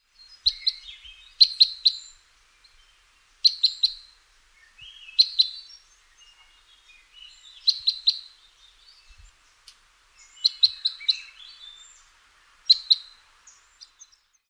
red crossbill

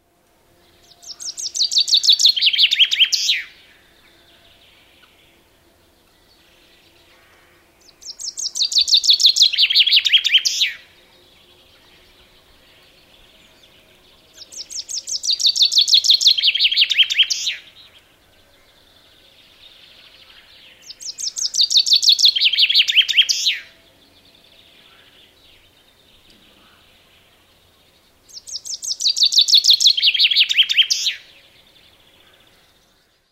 chaffinch

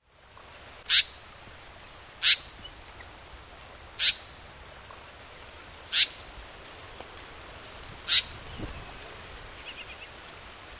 grey fantail

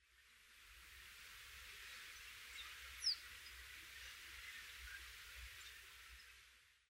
yellow wagtail, nocmig

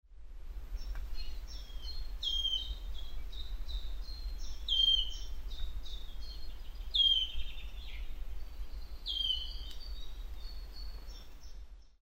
red breasted flycatcher; grzedy

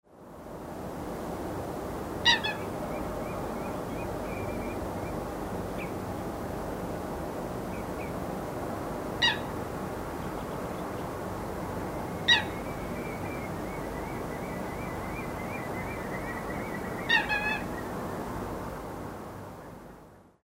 coot nocmig

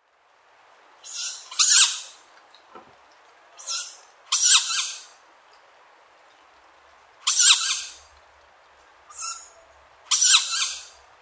tawny owl

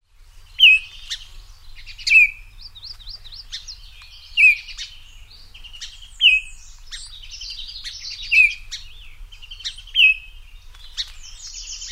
baltimore oriole

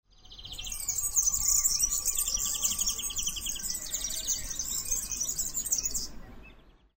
serin

